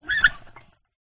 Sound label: plastic handle turn squeak single; foley